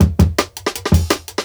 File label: Jungle Breaks